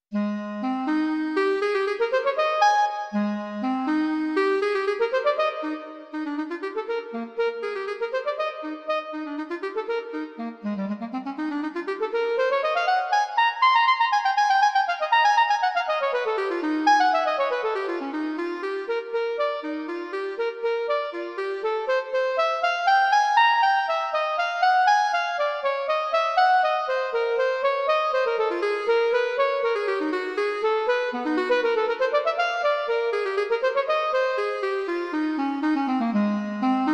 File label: intermezzi animato